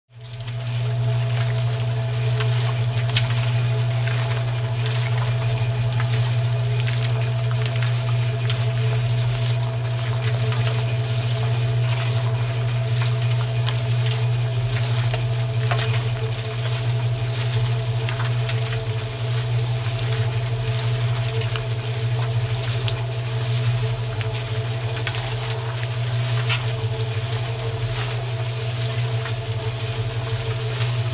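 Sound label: concrete mixer sound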